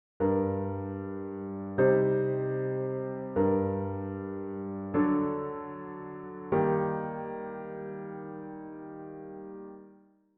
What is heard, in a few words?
harmonic error detection; ear training